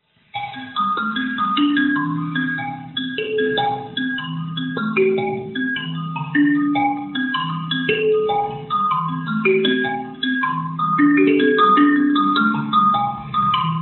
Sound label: kalimba